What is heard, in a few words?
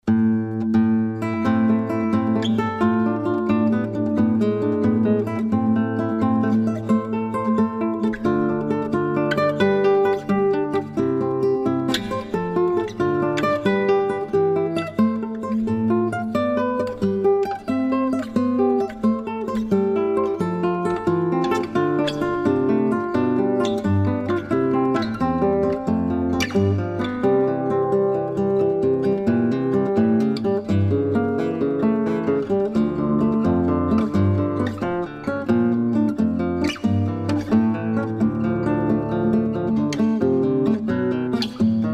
Prelude in A Minor